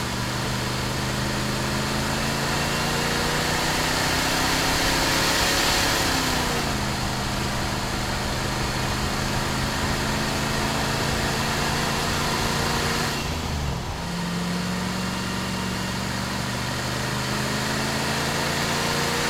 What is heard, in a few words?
vehicle suv drive; teardown; ps5 windows xbox series xs